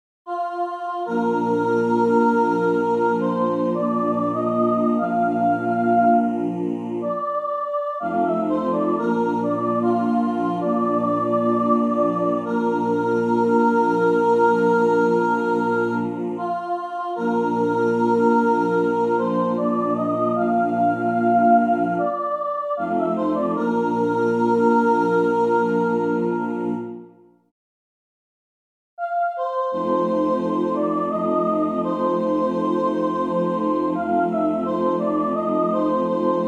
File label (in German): Beckenrieder Kuhreihen instr